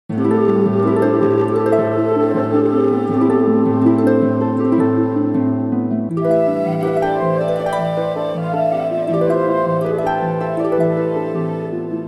Morska harfa, Hudba; Revelation Sight and Sound SK

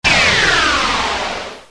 Raketa energia; Vesmir; Revelation Sight and Sound SK

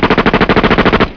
Strelba z gulometu; Revelation Sight and Sound SK

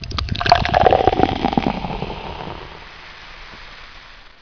Nalievanie vody; Domacnost; Revelation Sight and Sound SK

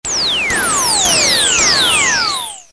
Laserova zbran; Vesmir; Revelation Sight and Sound SK

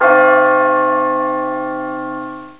Zvon; Mesto a dvor; Revelation Sight and Sound SK

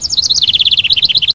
Vtacik; Mesto a dvor; Revelation Sight and Sound SK

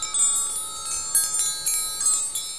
Zvonkohra - dokola; Pocasie; Revelation Sight and Sound SK

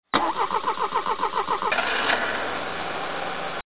Auto startuje; Doprava; Revelation Sight and Sound SK

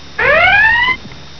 Poplach; Vesmir; Revelation Sight and Sound SK

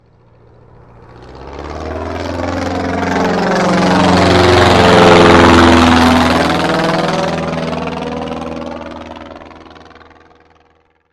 Lietadlo; Doprava; Revelation Sight and Sound SK

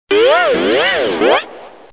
Vlnenie; Revelation Sight and Sound SK

